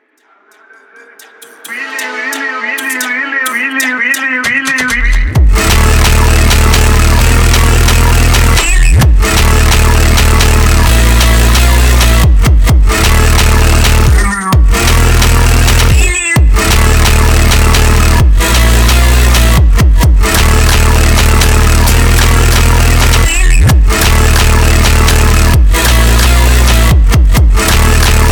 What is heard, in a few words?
slowed